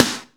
clear snare single hit b key, snare drum sound tuned to the B note